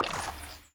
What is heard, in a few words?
Plasma Hit